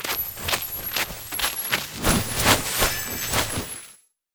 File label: emote dance; LoL; ahri